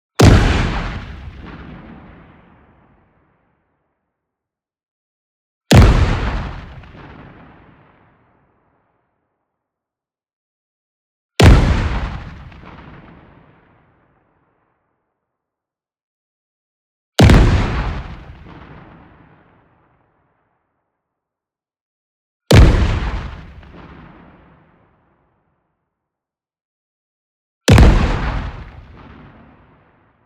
EXPLOSION SHORT Cannon Shot; boom library; Anime Essentials

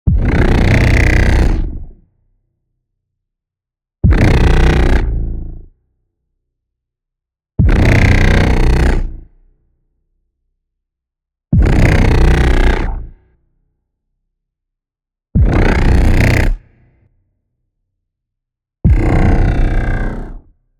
BLAST Bubble Bass; NEON; boom library

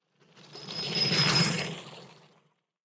bicycle dirt; Blind Drive

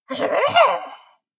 Zombie Happy; Blind Survival

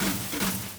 robo double; Apotheorasis

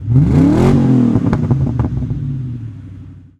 Mustang Rev; SFX Kit; Automobiles

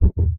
bottom bump; Blind Drive

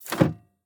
Semi Truck Brake; Large Vehicles; Blastwave

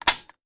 Shotgun Foley; Sound Ideas; Guns

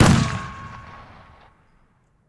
Bullet Impact; Sound Ideas; Guns

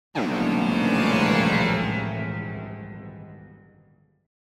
Symphonic Pad Going Down Pad - Light Down; Hollywood Edge; sound designer's toolkit v2

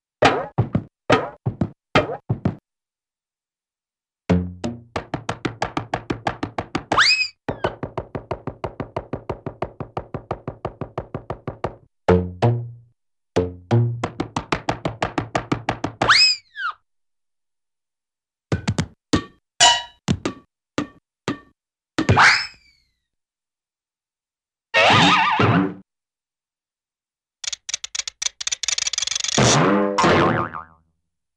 Funny Kick Bounces, Cartoon; Hollywood Edge; Cartoon Trax

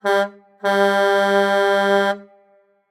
Semi Truck Horn; Large Vehicles; Blastwave

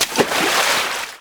Bodyfall Deepwater; SFX Kit; Bodyfalls

